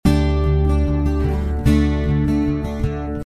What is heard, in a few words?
Picking D 80